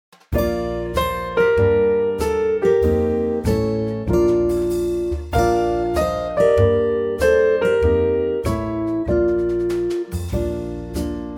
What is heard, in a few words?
drinking champagne Bb